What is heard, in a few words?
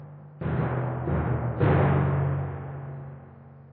Tymp Hit; Lost Zasad